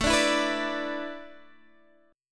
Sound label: A Suspended 2nd Suspended 4th, Guitar, Standard; Asus2sus4 strummed; JGuitar